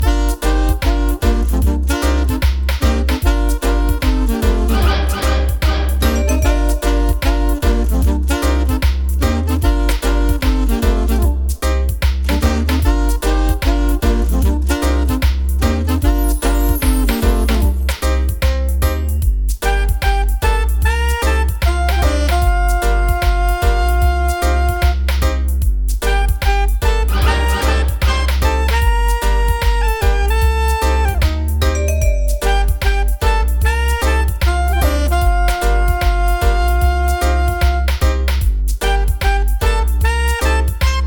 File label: saxophone style